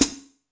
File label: AMB HH PDL, AMBIENCE HI HAT